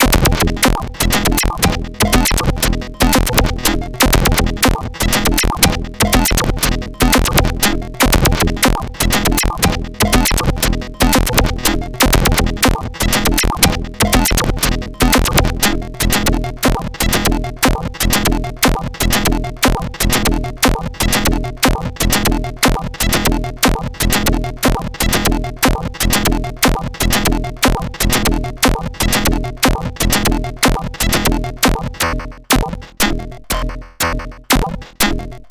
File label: piston drums; rcd ustep; modular demos